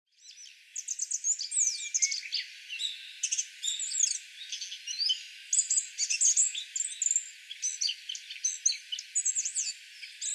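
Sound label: Asian Brown Flycatcher; koe; hyogo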